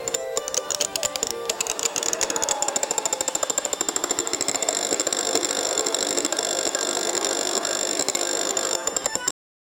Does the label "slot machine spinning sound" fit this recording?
Yes